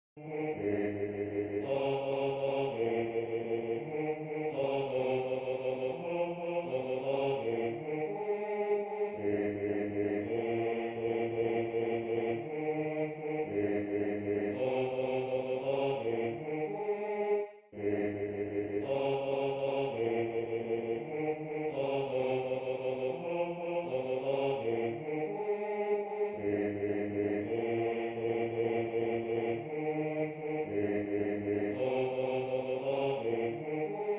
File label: Bass